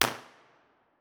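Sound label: tunnel entrance d 1 way mono, impulse response, Innocent Railway tunnel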